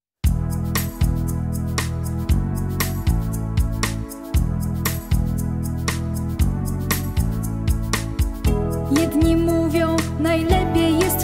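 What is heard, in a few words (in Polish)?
Wesoła piosenka patriotyczna z tekstem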